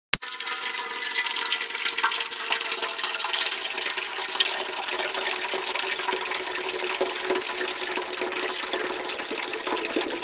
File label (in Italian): secchio acqua